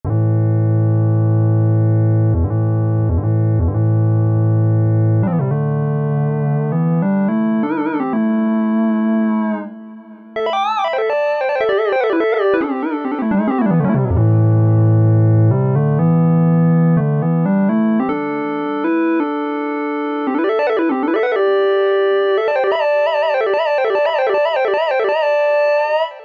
Minimoog Lead; synthesizer; Guess the synthesizer game

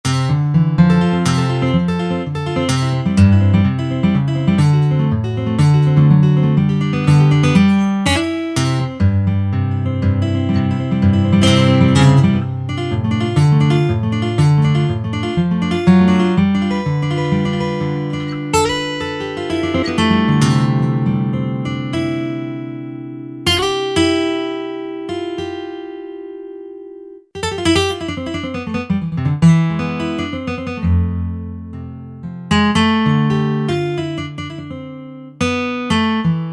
GUITARS Steel String Acoustic; Apple GarageBand 2

